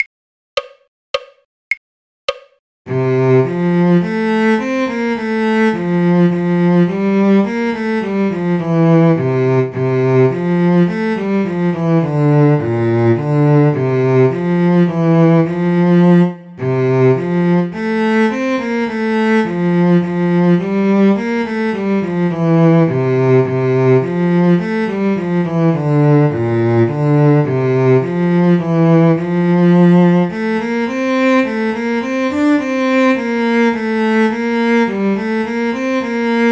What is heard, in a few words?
cello; the ash grove; folk